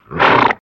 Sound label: Horse Snort Generic